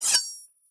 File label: Knife